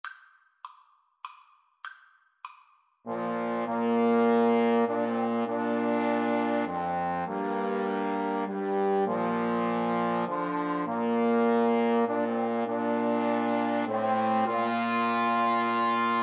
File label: amazing grace, Trombone Trio